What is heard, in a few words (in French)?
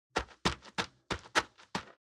pas gravier; La-Fabrik